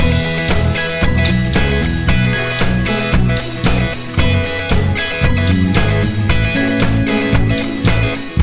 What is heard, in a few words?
Happy Face, Loop